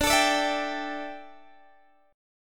D Suspended 2nd Suspended 4th D down Guitar DADGAD